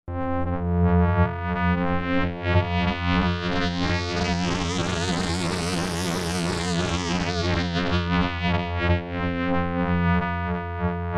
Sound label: growing lead